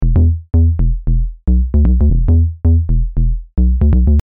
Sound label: Bass; PatchFactory